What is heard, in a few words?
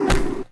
Stretch Tree hit